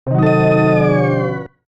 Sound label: Lose; The Sims 2; Nintendo DS; Ratticator